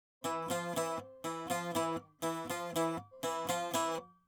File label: saz